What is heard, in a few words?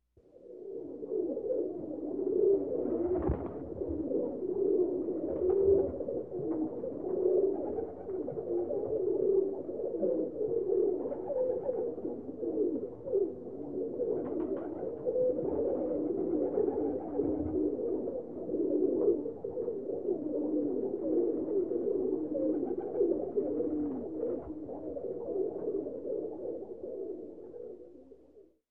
Birds clucking distant underwater